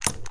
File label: empty, weapons; Dystopia